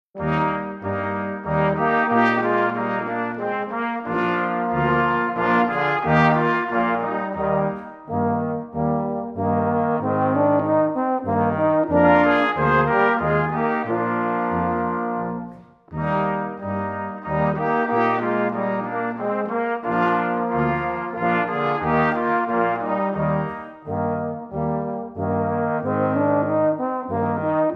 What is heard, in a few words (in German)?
Blechblaeserquintett Menueto